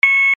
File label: control beep